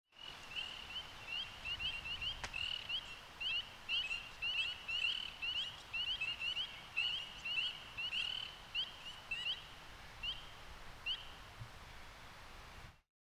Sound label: Spring peepers